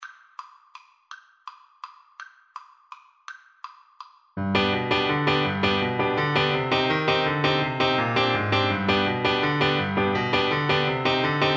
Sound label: horn, French Horn